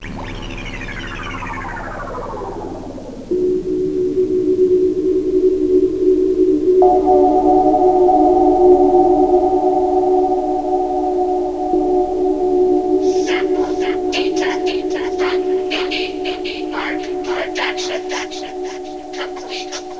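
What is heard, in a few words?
shut down; MusicScapes Professional; Wayzata Technology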